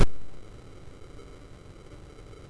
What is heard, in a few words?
sid MOS6581R2, GAMES; Face Off